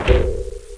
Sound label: CLUNK; Gunlok; Robots